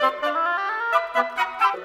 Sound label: Winds, Orchestral